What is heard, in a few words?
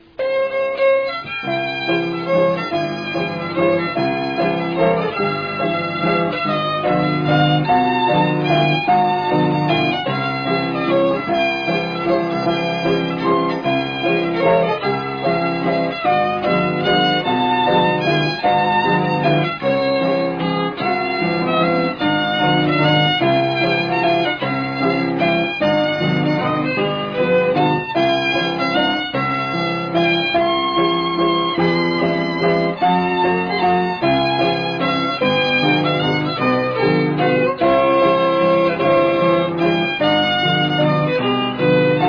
Old Waltz In C